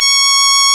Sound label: REED C#; Big Fish Audio; Synclav FM